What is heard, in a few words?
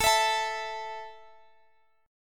A 5th A down Guitar Half Step Down